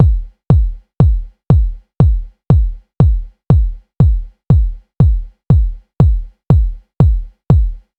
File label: kick drum test live